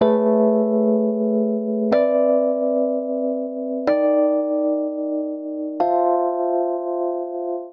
Progression Rising Major Uptempo I - bIII - bV - bVI A Major 124 bpm, Electric Keyboards; Ableton; Factory Packs